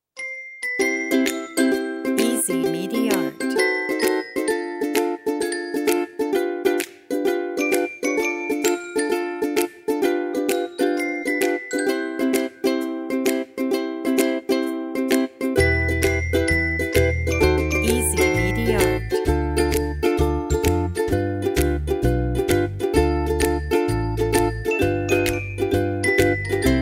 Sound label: joyful background